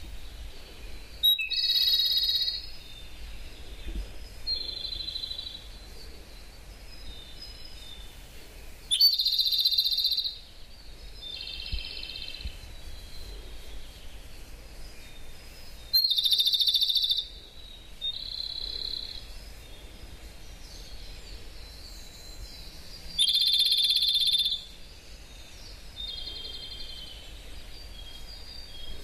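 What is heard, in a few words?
Japanese robin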